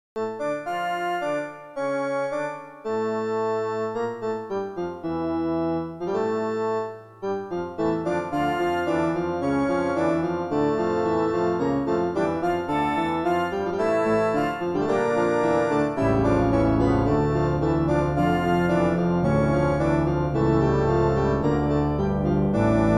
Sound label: Magic organ; king s quest 5 soundtrack mt 32